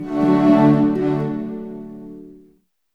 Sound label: HAPPY, ORC Happy Hour; East West - Scoring Tools